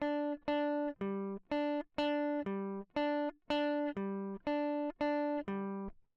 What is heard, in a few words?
electric